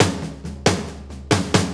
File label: 4-4 Fill, Drum Loops